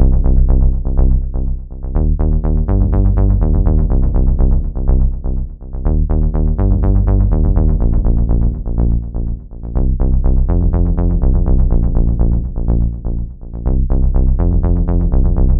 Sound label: arcade bass; lucah